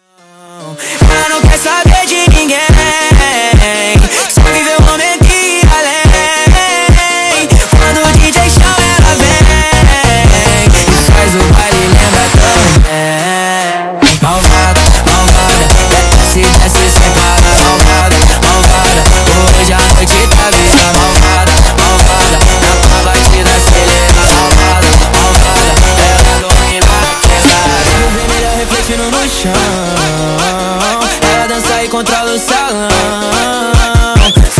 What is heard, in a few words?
sped Up, funk